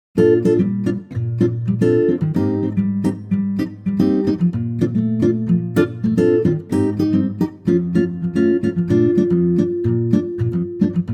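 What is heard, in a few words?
Bb Acoustic Guitar; key - Bb (Bbm at the bridge); vocal range - F to Db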